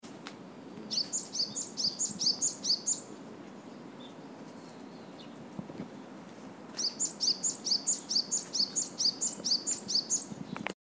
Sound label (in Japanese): sijukara song, tori